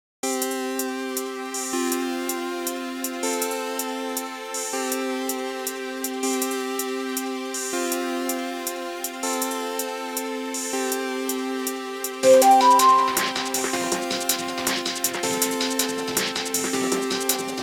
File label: inst